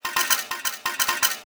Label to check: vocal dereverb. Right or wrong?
Wrong